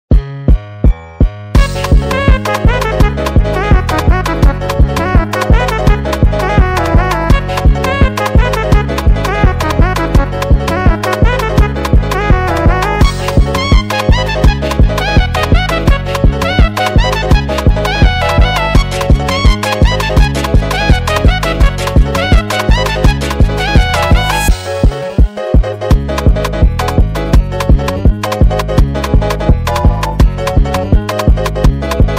Chess Type Beat speedup

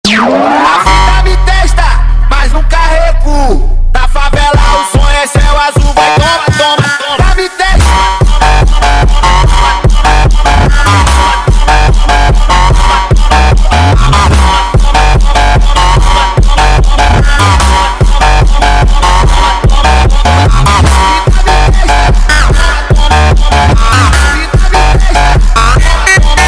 Funk sped Up

